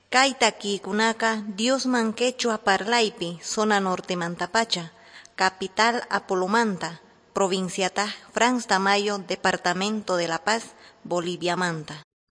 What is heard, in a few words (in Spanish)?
songs quechua norte de bolivia apolo